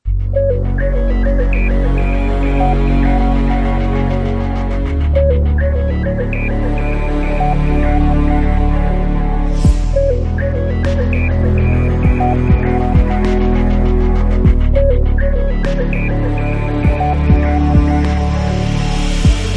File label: No Choir